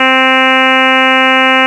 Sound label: pulse